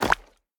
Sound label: footstep; Smite; Tiamat